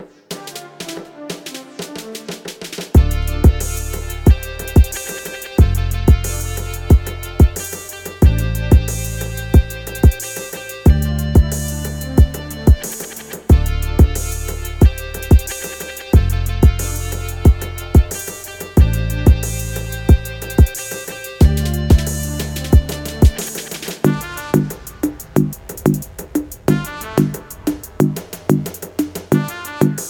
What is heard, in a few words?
newragga